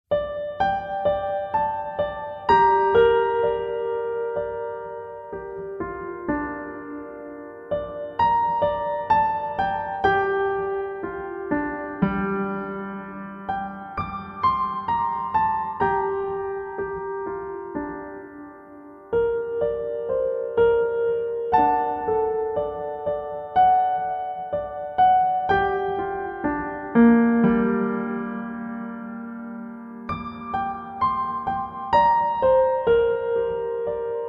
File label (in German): Entspannungsmusik für Babys